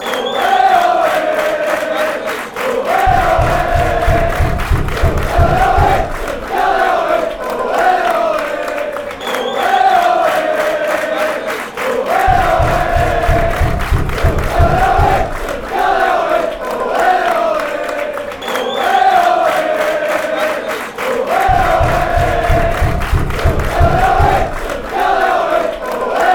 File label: oway oway fanchants